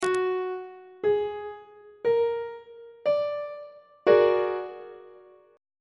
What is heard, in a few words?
a sharp augmented 7th 2nd inversion; on treble clef